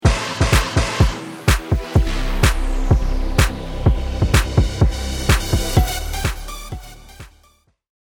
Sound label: lost round, music